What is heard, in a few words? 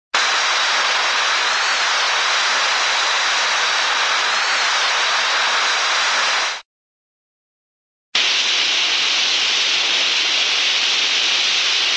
steam thin steam hiss; cartoon